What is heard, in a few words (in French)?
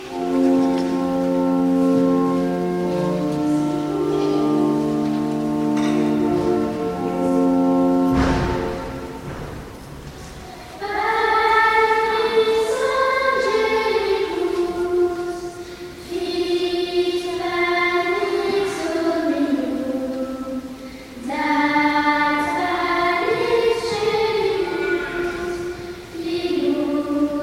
chant panis angelicum